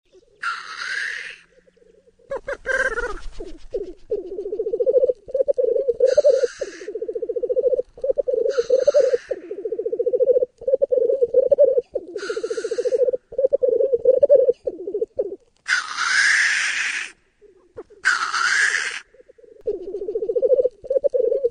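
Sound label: teterev golos, black grouse voice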